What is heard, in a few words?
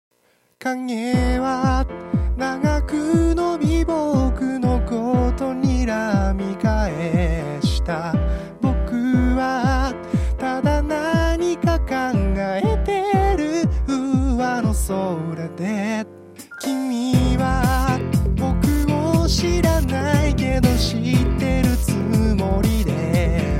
song drum